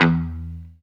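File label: guitar mute; 90's Sample CDs; Club-50 - Foundations Roland; Funk & Mute; Chunk Mute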